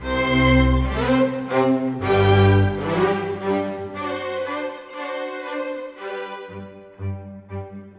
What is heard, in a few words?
Classical Strings, orchestral; orchestral 1